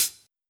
juicy pedal hi hat sound B key, hi hat tuned to the B note